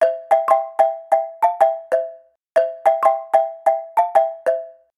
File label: Melody, ringtones; China Telecom